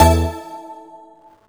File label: BGM SYNTH